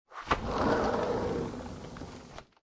Door Open Sliding Glass

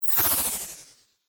Ghost Whoosh; Ghost Sound Effects; horror

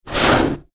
Monster Snarl Sniff Breathe Creature; Sniffling; Human; Animal Monster Snarl Sniff Breathe Creature; Blastwave

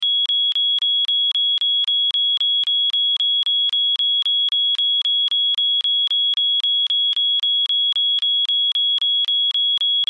Alarm Hotel Fire Beeps High Pitch Loop; Alarms; Emergency

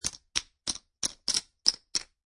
Poker Chips Stacking

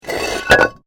Brick Medium Hollow Move; Material components for constructing more complex sounds; Rock Sound Effects

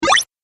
8 Bit Style Health Beep; Video Game Sound Effects

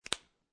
Pen Close Cap

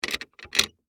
Metal Latch Move, Foley, Metal, Latch, Movement; Latches; Objects

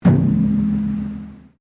METAL IMPACT BONG; Materials

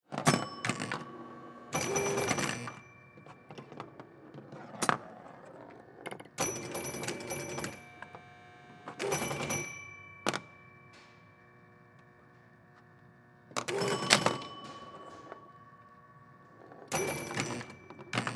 Pinball Play Ambience, Pinball Play Game; Arcade; Arcades; SoundEffects-Blastwave; Sports

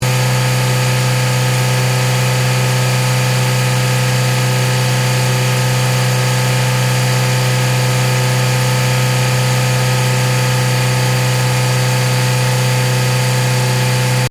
HYUNDAI ACCENT 3000RPM ENGINE BAY LOOP, car; Hyundai hire car; Cars (Sound Effects:Vehicles)

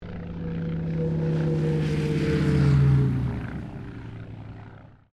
AIRCRAFT CA-13 BOOMERANG LANDING; Airplane Sound Effects; Airplanes (Sound Effects:Vehicles)

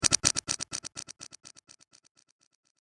Metal Multi Tap Echo

